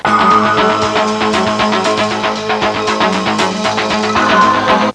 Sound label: exciting